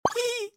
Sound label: Grin; Kitty Shorts Simulator; Android, iOS